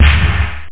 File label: sdrum